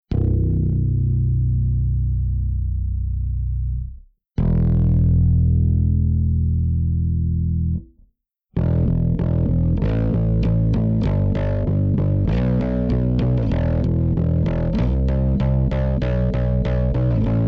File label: PSA1 STANDARD